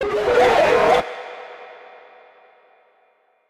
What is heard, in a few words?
jumpscare sound; five nights at jrennys 4